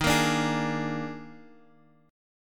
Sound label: D sharp Major 7th Suspended 4th D sharp Guitar Half Step Down, chord